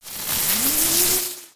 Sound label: Candy cane blocker Powerup; Candy Crush Soda